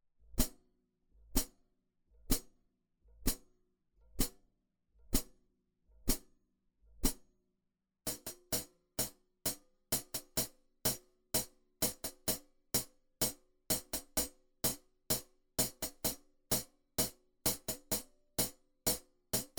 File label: Hi Hat